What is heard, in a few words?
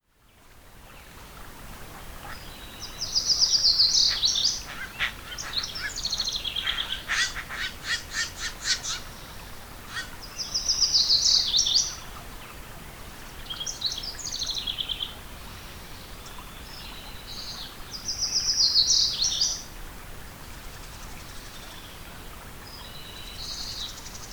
Soundscape; AvianVisitors